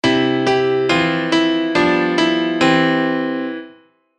piano

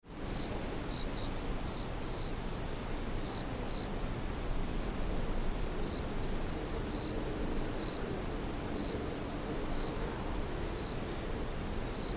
singing of insect

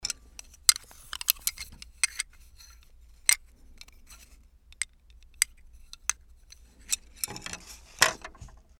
ramen chopsticks

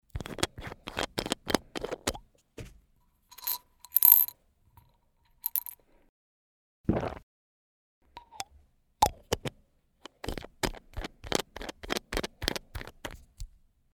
bottle medicine

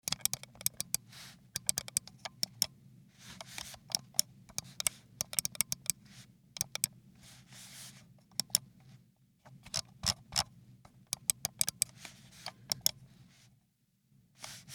PC mouse win

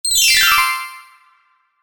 kirarin

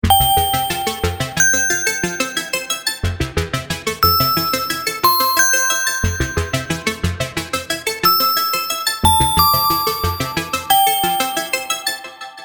JINGLE synth

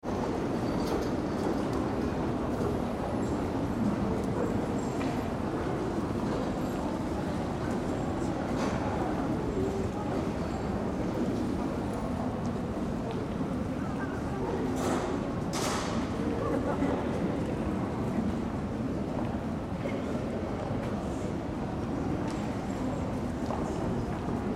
museum hall

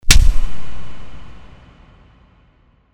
punch

